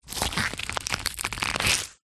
food drink ice cubes in rubber mold bend stress loosen; zapsplat